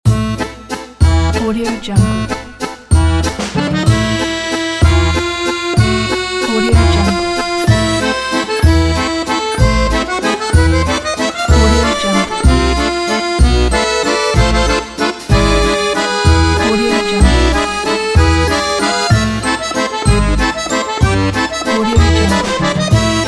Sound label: a french waltz; nicky-jazz